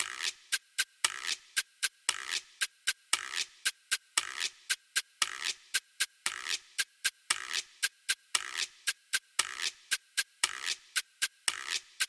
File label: cha cha cha guiro